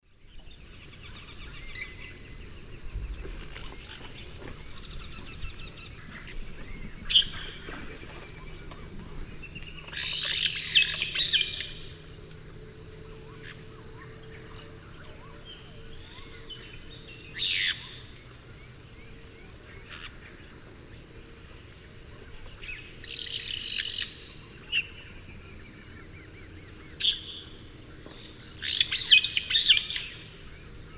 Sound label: Pionites melanocephalus, pair calls; S. America; Eastern Lowlands